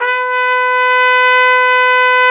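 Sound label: RCA trumpet, jazz; Solo Brass; Trumpet 5-7; Tp 5 RCA Jaz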